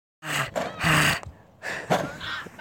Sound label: growling